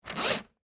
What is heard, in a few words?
Typewriter Remove, Technology, Typewriter, Remove, Paper, Fast; Typing; BlastWave